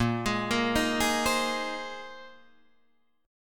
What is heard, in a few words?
A sharp 9th Suspended 4th A sharp Guitar Drop D